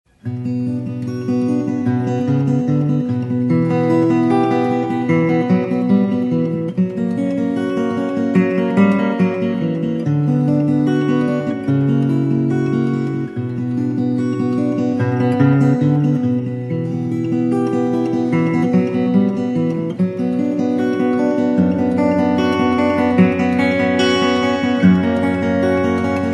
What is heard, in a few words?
Allegro in A minor